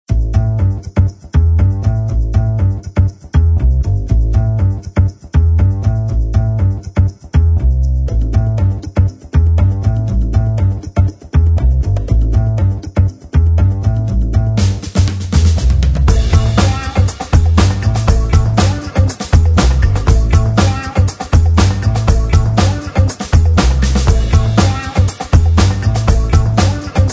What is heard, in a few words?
Funk; 正面时髦的背景音乐与朗朗上口的低音槽和打破鼓。